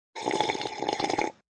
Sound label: Long Sip, Long sipping sound.; Human; Drinking